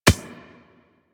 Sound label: Beach Ball Floof Bounce Dirt